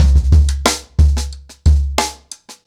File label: 90BPM, ACOUSTIC DRUMS